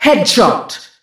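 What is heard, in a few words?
female headshot; Monster Hunt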